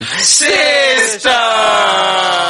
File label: notification